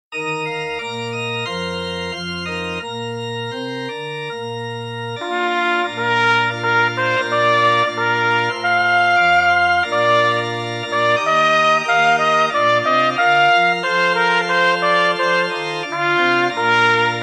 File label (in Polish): te deum prelude 1 trabka